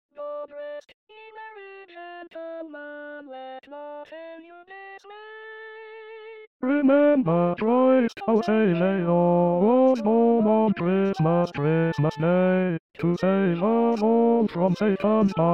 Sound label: Bass; God Rest Ye Merry; christmas